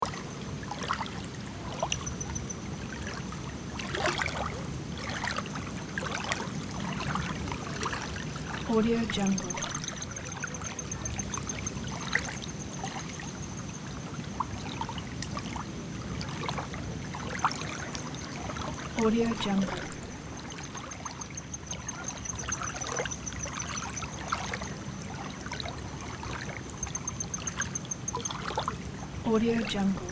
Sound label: lake ambience loop